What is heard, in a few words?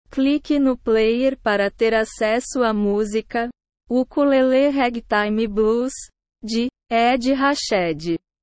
ukulele ragtime blues